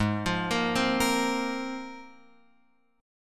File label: G sharp 6th Add 9th, Guitar, Open F; arpeggiated; JGuitar